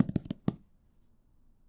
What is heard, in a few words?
Hardcover Taps, Books; bb - Books, Paper, Writing